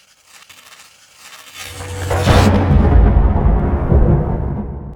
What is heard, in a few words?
crack; DimDoors